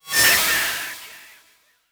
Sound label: Aggressive Transition